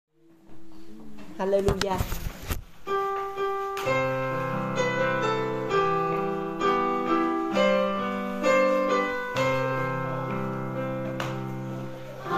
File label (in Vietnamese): Alleluja Ca Doan; ca doan teresa